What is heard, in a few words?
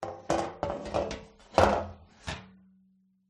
baking tray place on counter